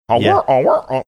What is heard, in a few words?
imitating bike horn